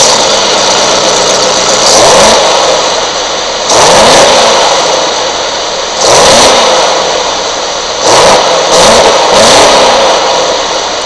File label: motor